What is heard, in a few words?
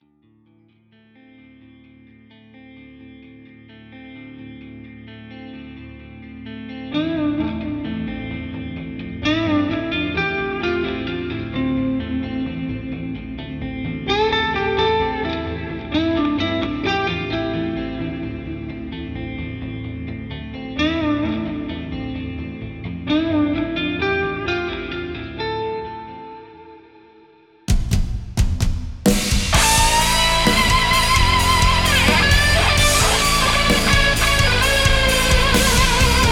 cinematic rock